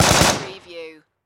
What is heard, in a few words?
Machine Gun M4A2